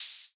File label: Noise Open Hihat; Cymbals